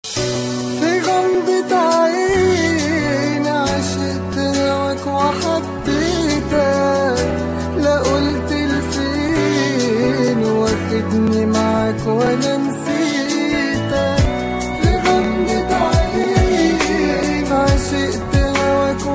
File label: Arabic Amazing